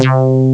Rubber Bass, Amiga Soundtracker; ST-XX Amiga Soundtracker Sample Packs

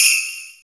Sleigh, Roland R-8

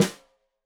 Snare Stereo, Drums; Deeply Sampled Drums

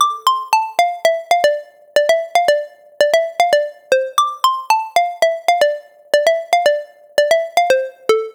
115 D Phr Soda Pop; Zero-G; Future Wave

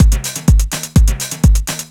tiny timpani

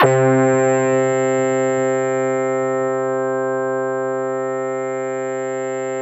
RESOTRAIL, JV-1080

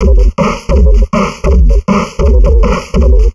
160, Drum Loops Crazy Processed; SONMOD; USB Soundscan vol.07; AKAI

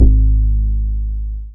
BAS FM DX-7

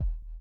SONAR R; Spectrasonics; Metamorphosis

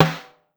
Snare, Drum Machines Clavia DDrum-AT Pitched Down

